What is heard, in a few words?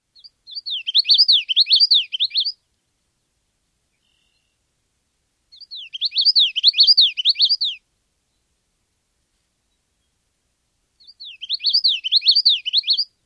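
Common Yellowthroat songs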